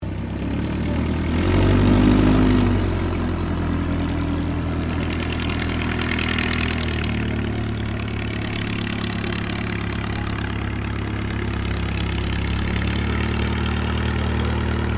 AIRCRAFT DH82A DEHAVILAND TIGERMOTH IDLE PREFLIGHT; RAAF Tigermoth; Airplanes; Vehicles